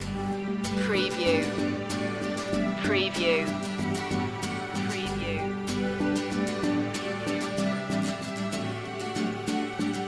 loop

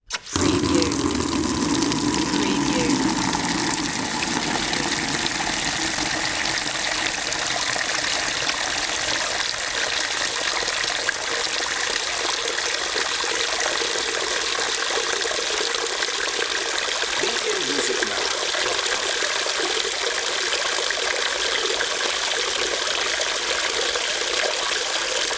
plastic bucket fill

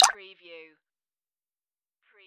wet; Button / Multimedia Sounds

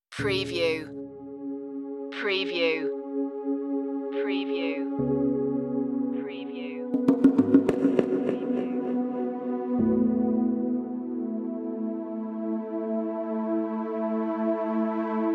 ocean flute

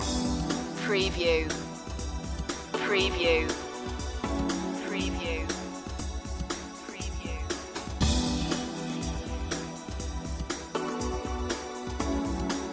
electric dreamland loop

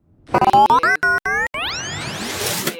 Scifi Production Element - Odd Behavior

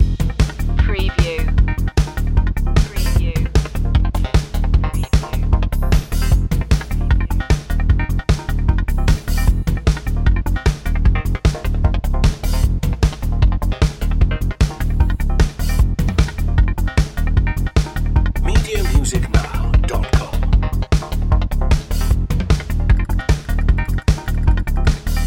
information age loop

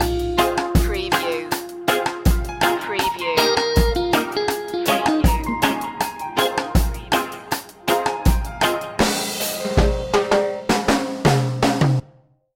sunshine movement stinger

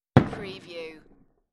Firework bang; beanstalk audio

